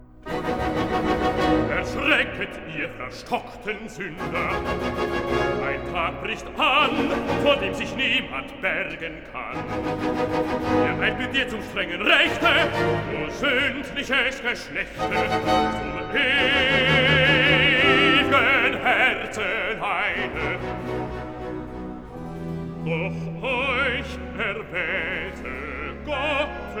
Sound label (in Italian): Recitativo (Bass); BWV 70 'Wachet! betet! betet! wachet!'; Part 1, II. Recitativo (Bass) 'Erschrecket, ihr; Bach; Lüneburg; 4th Sun in Advent; Cantata